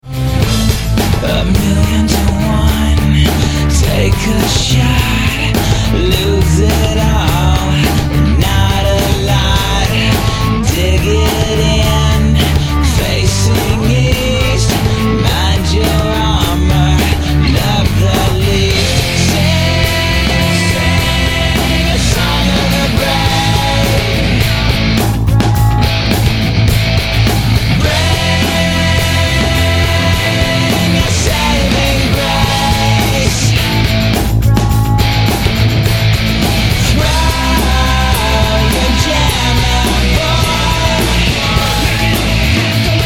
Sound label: Guitar